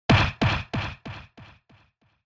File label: Hit Punch Delay